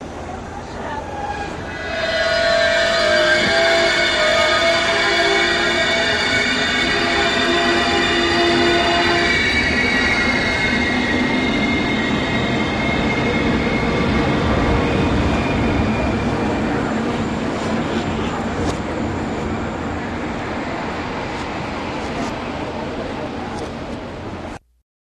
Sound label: El Train Backwards